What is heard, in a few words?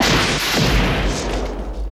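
laser gun; Total Control - Action Cutz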